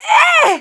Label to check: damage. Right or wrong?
Right